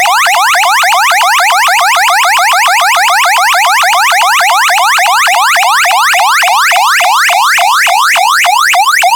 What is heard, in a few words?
ALERT A ALERT B, siren